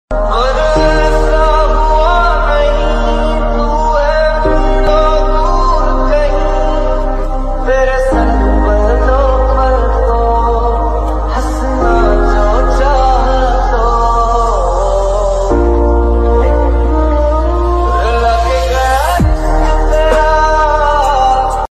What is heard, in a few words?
relaxing bgm motivational ringtone; doctor